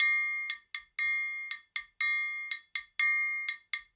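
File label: triangle, Percussion Loops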